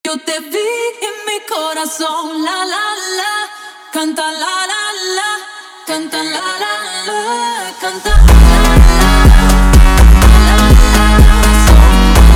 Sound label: slowed